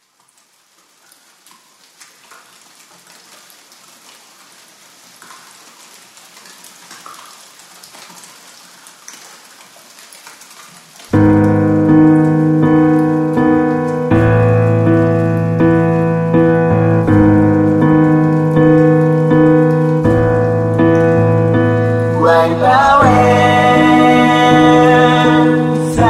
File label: calling rain mix; el:cid